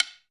Lo Sticks, Alesis D4

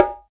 DM5 Perc, Alesis DM5 drum machine

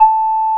OB8 BLOW A4, OBERHEIM OB8, Vintage Keyboards; Big Fish Audio; First Call Vintage Keyboards

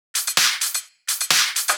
Clubhouse Loop 128 BPM

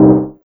SYN, Drum & Bass, 160 BPM; Ueberschall; Drum & Bass Flash Trackz

